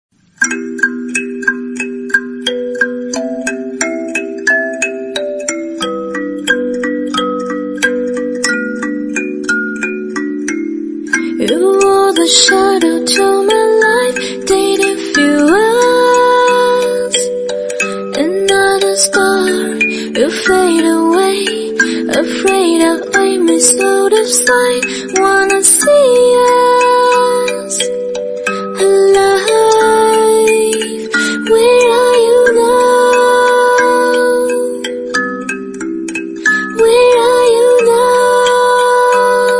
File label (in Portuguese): Kalimba